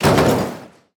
car metal impact; factorio 2.0.55

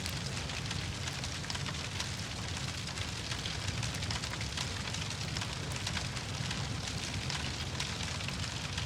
fire; factorio 2.0.55

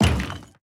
car stone impact; factorio 2.0.55

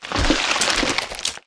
behemoth gore; factorio 2.0.55

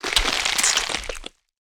big gore; factorio 2.0.55

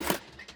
power switch activate; factorio 2.0.55

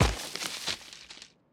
tree leaves; factorio 2.0.55

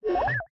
robotic inventory pickup; factorio 2.0.55

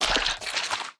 spawner gore; factorio 2.0.55

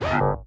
spidertron inventory pickup; factorio 2.0.55

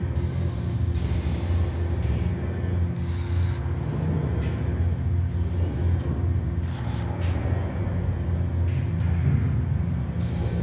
rocket silo working; factorio 2.0.55

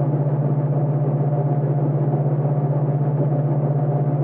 transport belt; factorio 2.0.55

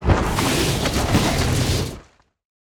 spidertron debris; factorio 2.0.55

